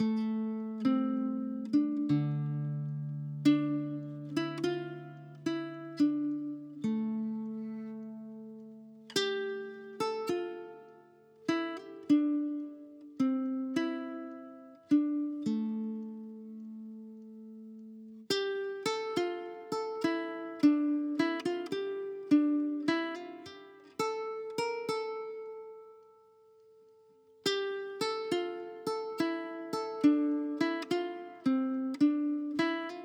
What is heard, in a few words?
guitar